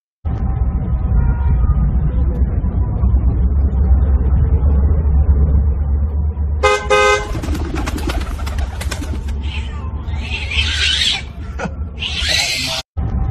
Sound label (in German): Katze mit Taube